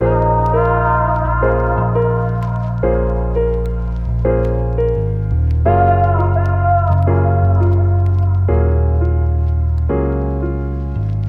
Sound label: Lofi Melody Loop 85 BPM A# Min; Melodics; Cymatics - Eternity Sample Pack